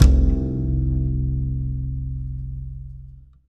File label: Single Acoustic Basses